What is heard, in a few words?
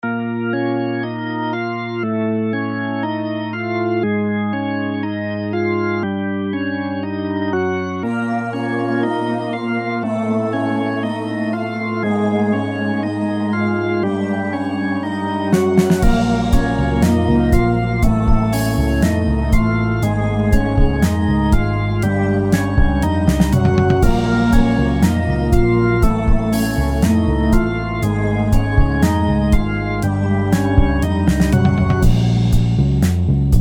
forest of organ; djRVR